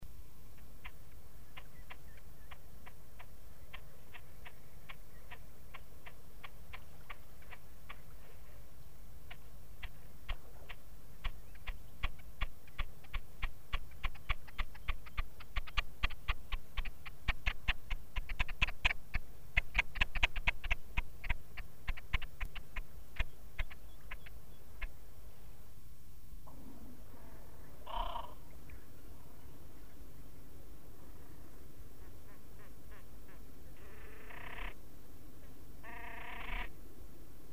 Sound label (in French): Grebe Huppe, Oiseaux; Sons Oiseaux